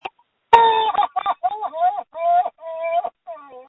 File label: Scream